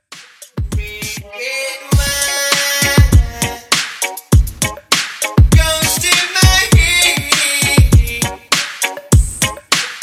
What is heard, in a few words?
90s Reggae 100 BPM